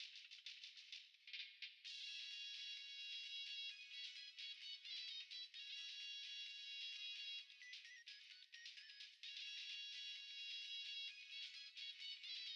BGM First Launch Demo Train Music Player

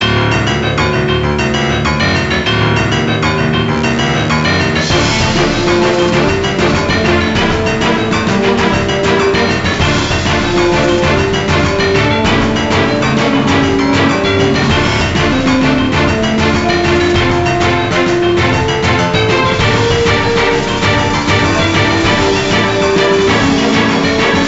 Battle; Iron Master The Legendary Blacksmith; NDS

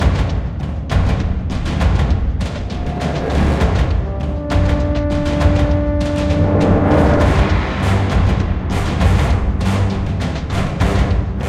MUSIC MINES COMBAT

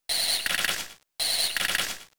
Spike Cannon 2hits; Pokemon; Gen 3; attack moves; RSE

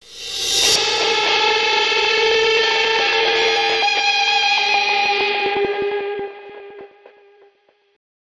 Powerplant Stinger; Transformers: Revenge of the Fallen; PS3; Windows; Xbox 360

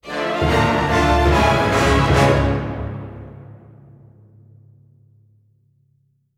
Revive Music; Zombie

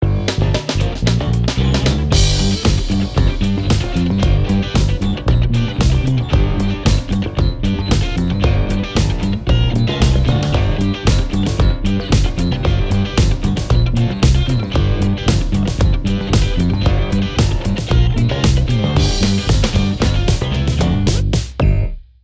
Robot Dance

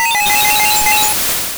Icy Wind; sfx; attack moves; pokemon; gen 2; gsc

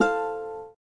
F Major Ukulele

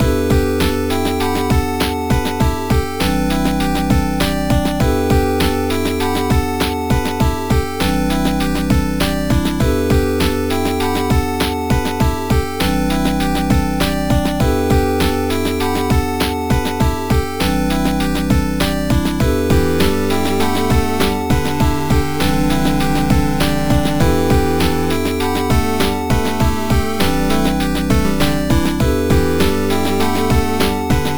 Game Over Chiptune Version; Flash

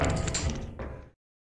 Spring Wobble; Lethal Company; Windows

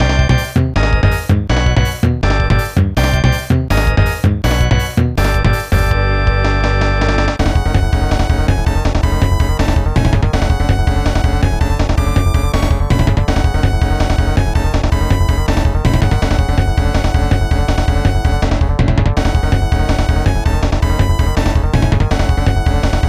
Major Boss Battle; mega man and bass snes

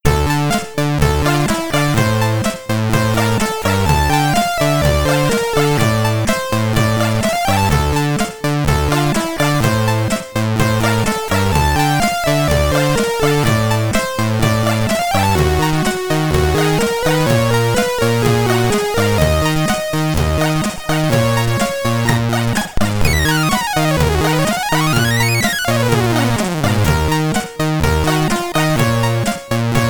BGM, commodore 64

